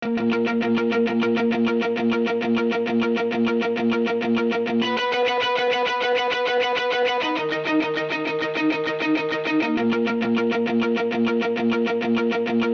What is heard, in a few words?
Gtr Arp No Tremolo